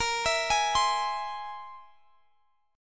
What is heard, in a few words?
C Augmented 7th Bb